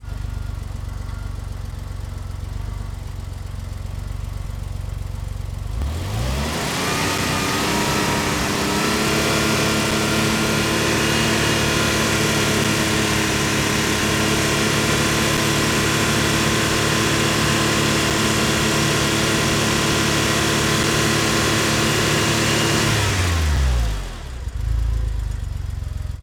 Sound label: Porsche 911T Fast Idle, Automobiles